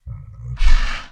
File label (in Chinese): 骆驼环境声